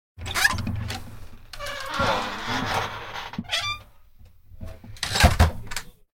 Creaking Door; 100 sound effects

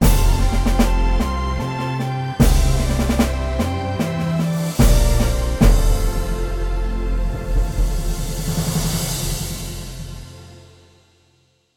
Game over!, jingles

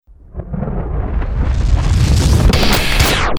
Rip Out, Stabs & Bursts; Best Of N2O Library

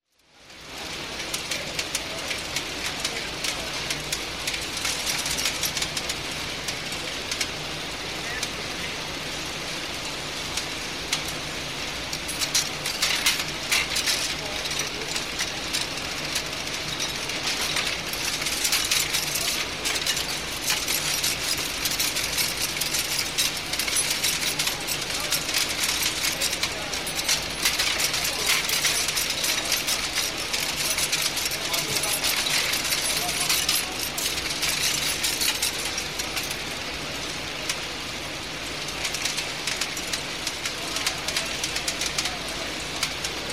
Linotypes; SOUND EFFECTS1

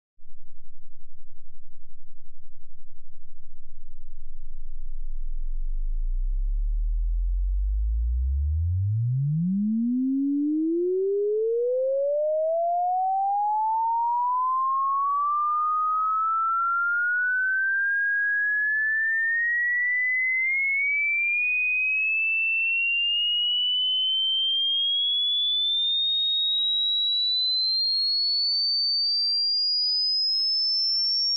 Oscillator Sweep; SOUND EFFECTS1

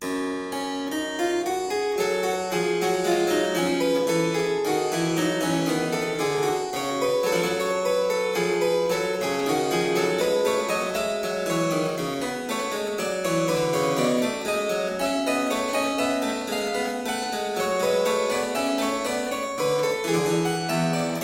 Invention 11 in g minor

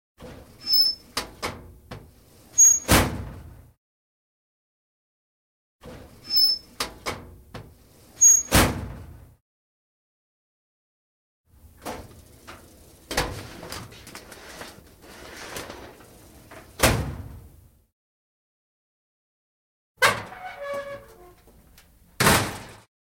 Filing Cabinet; 100 sound effects

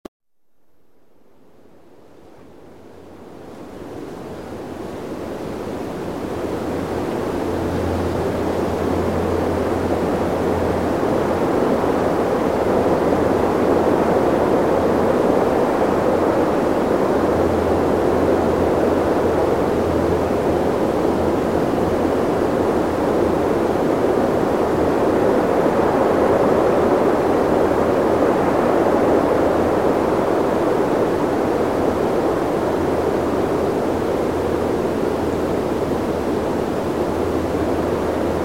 Medium Wind Airy

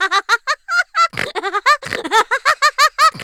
LAUGH, LAUGHTER; 1000fx

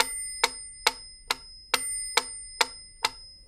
metronome 138; 1000fx

